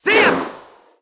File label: Sword Spin FX Mono; Link